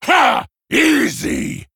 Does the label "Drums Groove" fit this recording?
No